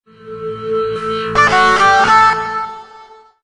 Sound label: ui power up mono; Motorola ROKR E8; ui sounds